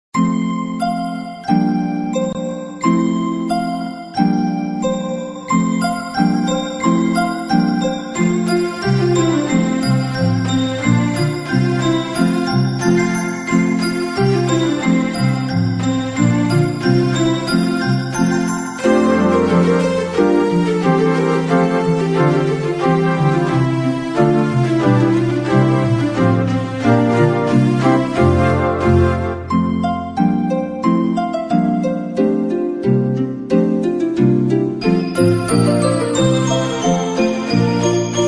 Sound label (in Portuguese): Musica De Natal Animada DingDong; Instrumentais